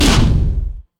explode; zuma; fertig classic games

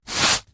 grab; iPuzzle

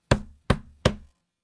tents place

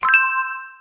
inventory; Fablewood

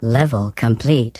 level complete; the jewel weaver

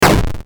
short death

